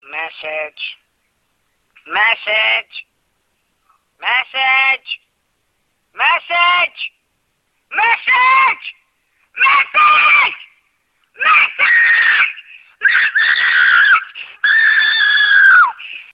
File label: Loud Message